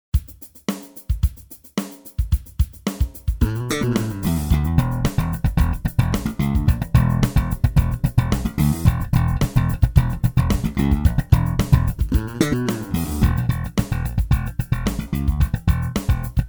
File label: SLAP; MTD